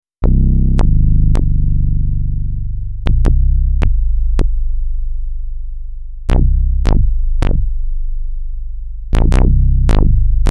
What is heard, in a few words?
Vermona 14 Bass